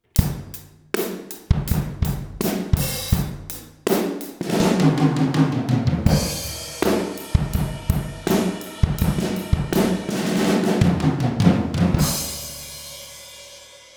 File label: 5 Piece 5 Mics, drum set